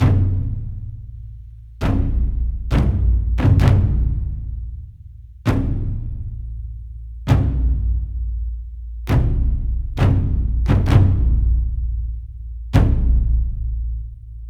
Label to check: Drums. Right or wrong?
Right